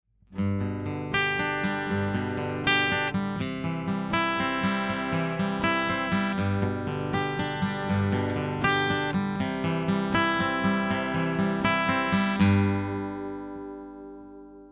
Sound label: Picking Pattern